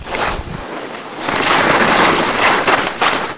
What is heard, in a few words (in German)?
abfahrt anfeuer; SkiAlpin2005